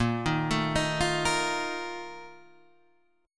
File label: Bb Major 7th Suspended 4th, Guitar, Drop D